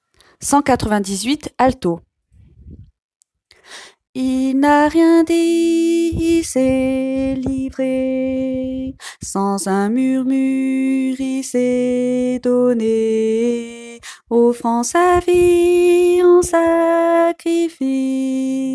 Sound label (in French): alto